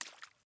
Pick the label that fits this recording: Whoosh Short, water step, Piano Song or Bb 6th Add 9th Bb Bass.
water step